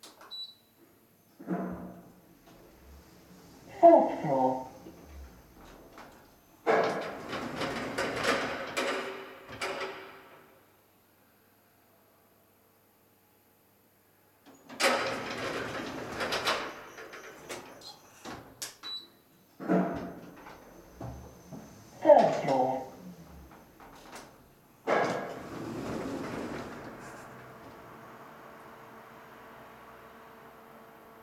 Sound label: Car park lift